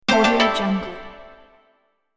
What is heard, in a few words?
asian game complete melody